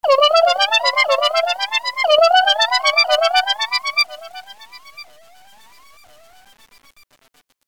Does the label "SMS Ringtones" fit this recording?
Yes